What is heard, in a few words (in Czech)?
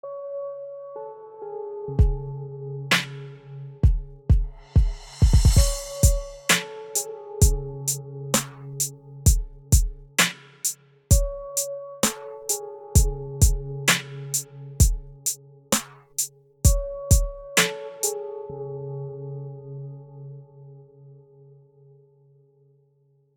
melodii beat; k vasemu zpevu